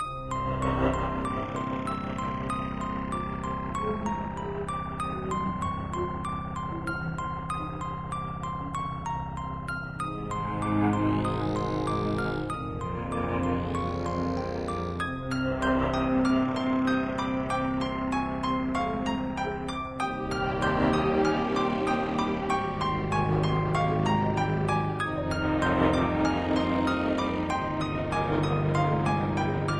menu

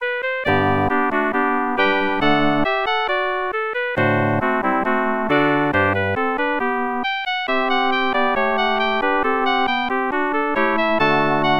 menu; heros

